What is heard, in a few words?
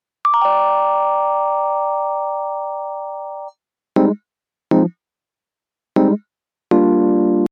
key